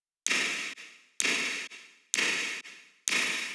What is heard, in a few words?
drum loop 128 Perc; Anthemic